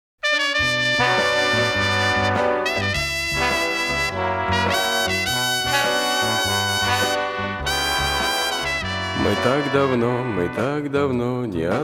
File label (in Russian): instrumentalnyy ansambl